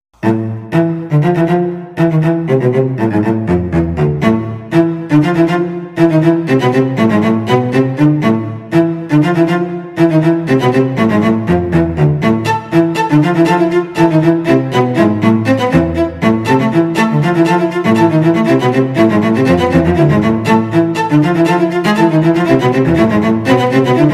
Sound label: 2 cellos